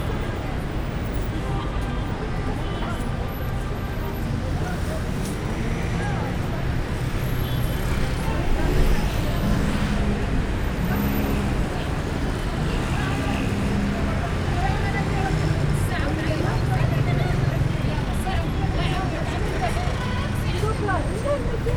buszmegalloban

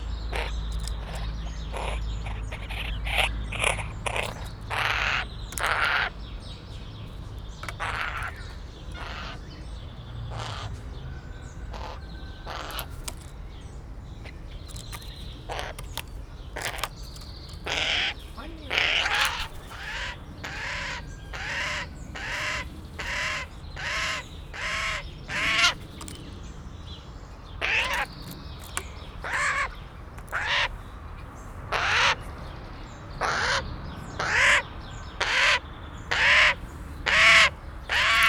folytatólagosan elkövetett önismételgetés, szajkó; kecskemeti zoo 2016

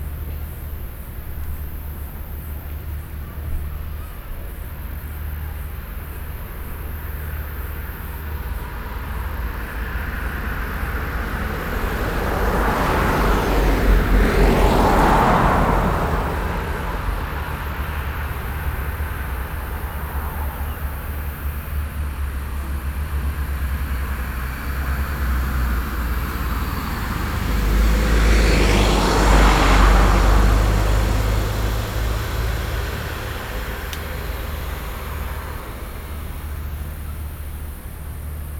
jobbról két jármű, kanyar; szállástól keletre; Thassos

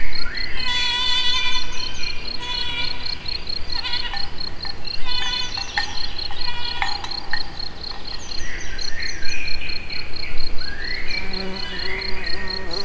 kecskegida; Egerturistaház

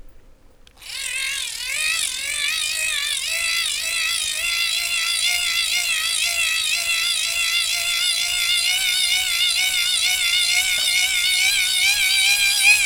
egyenletes tekerés, elemlámpa dinamós; vízház; cardioid

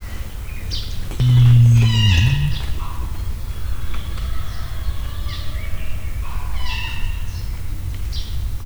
nandu; miskolci zoo 2009

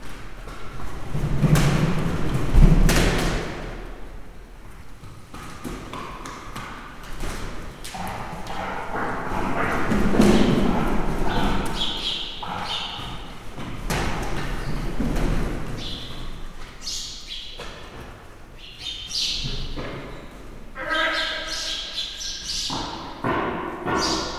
halkan, rózsás gödény; Hortobágyi Nemzeti Park

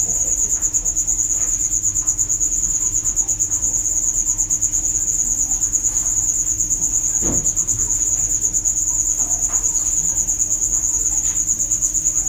takarmányozás céljából tenyésztett, banántücsök; jászberényi zoo 2015

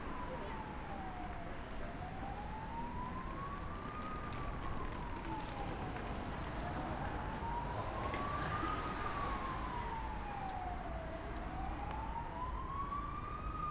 mentő; lehel ter; mentoauto

